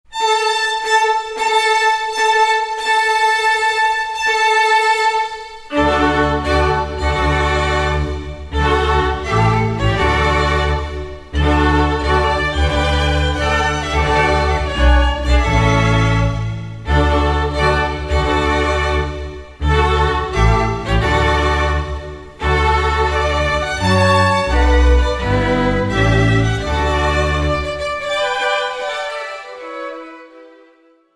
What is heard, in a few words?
Bridal Chorus